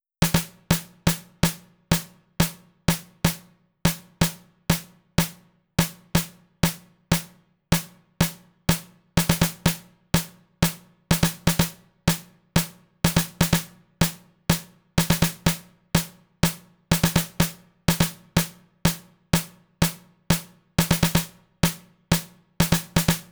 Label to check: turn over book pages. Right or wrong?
Wrong